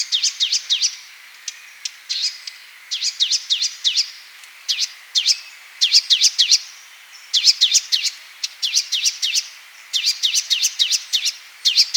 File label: Variable sunbird